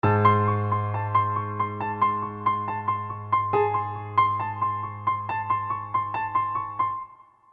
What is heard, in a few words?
Piano Suspense